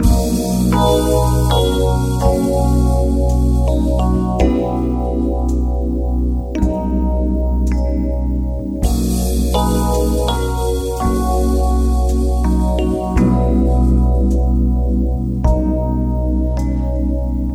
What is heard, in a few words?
keys gentle, jazz loops